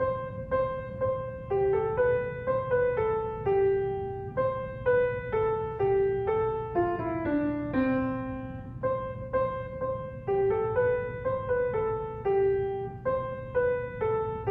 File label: Ein Feste Burg Melody